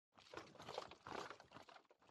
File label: Steps; Age of Empires III; cinematics